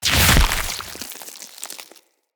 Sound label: Root Spider Elite Web Shot Proj Burst; Animals; Spiders; Abilities